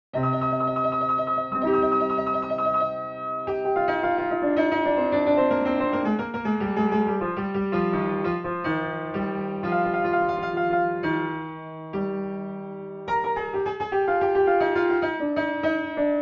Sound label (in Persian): Moqaddameh, piano; Segah; Radif Mahjoubi